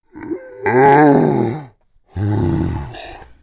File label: Pig Yawn; Angry Birds Space